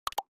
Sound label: staple bops